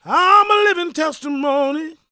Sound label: GOSPEL MALE; Spectrasonics Vocal Planet